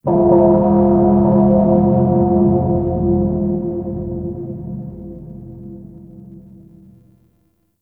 Primordial Bell; MITW; Warframe; Ambience; Entrati

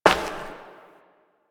Step Glass; Warframe; Koumei

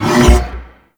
Double Spin Door Open; Orokin

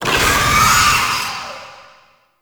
Summon Vocal Verb; Warframe; Uriel; Gulphagor

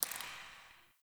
Derelict Interior Water Drip

